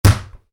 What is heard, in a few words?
Football bounce, Soccer Ball Bounce On Concrete; Sports; Stuart Duffield